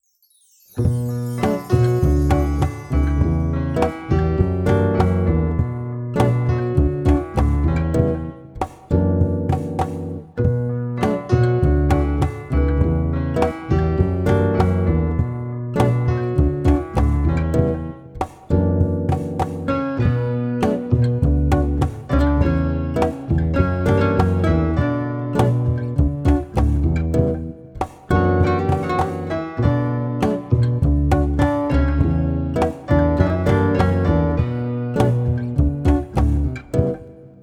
flamenco vibes, 432 hz

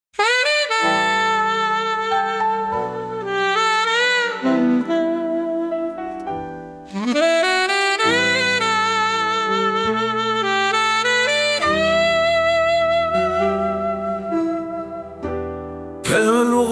velvet saxophone

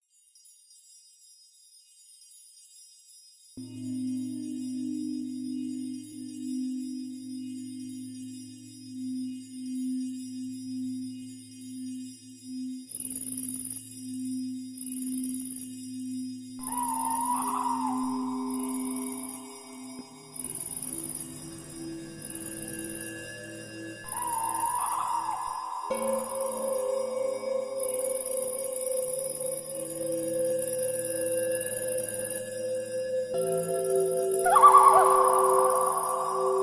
spa music night waltz 432 hz

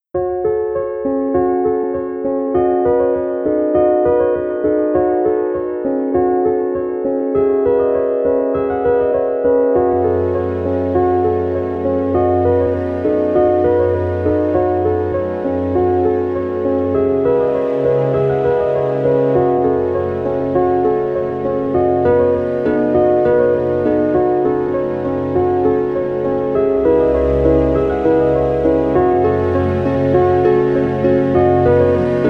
calm of drops, 432 Hz

